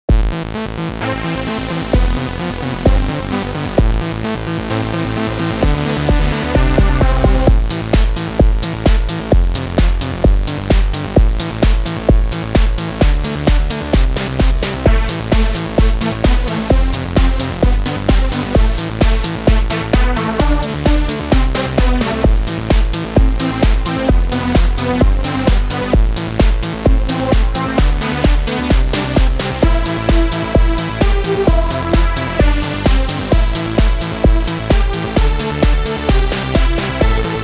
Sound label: Tal noisemaker; Aluode OSC 88